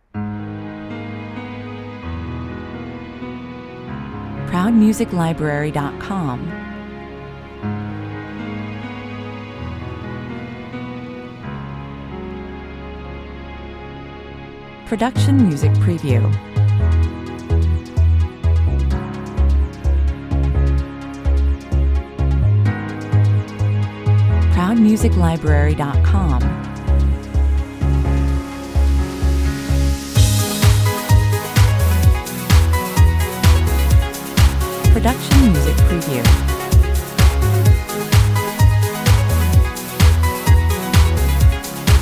Trance track, with a sensitive piano hook, dreamy backings, 128 bpm